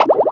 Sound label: Drill; Random Sound Pack